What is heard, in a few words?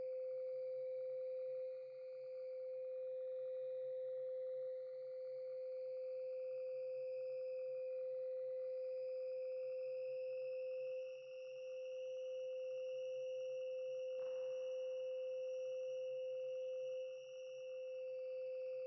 Breaker Room Ambience (Powered Down; five nights at freddy s fnaf sister location